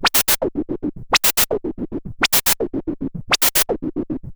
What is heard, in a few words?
MiniBrute 110BPM, Gated Seq Noise